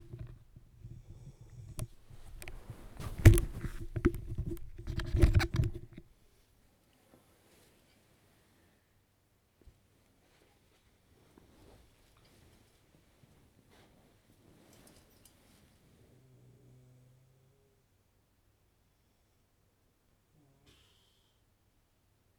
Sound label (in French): Ronflement Homme; ZoomH2N; Javras; Humain